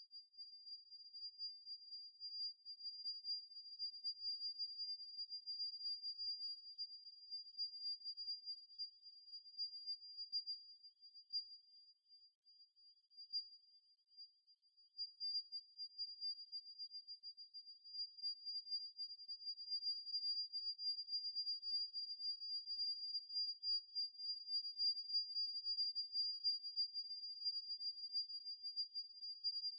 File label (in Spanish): gorriones; berna; choir of species